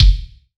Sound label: EL KICK, GM drum set; AKAI S-Series CD-ROM Sound Library VOL-8